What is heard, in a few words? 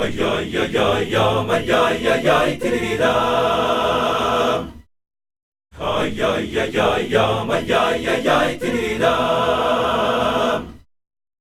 vocal loop idyllic voices 80